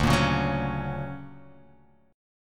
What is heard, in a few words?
D Augmented Major 7th down, guitar